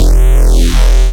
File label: Reese; neuro; BIG5AUDIO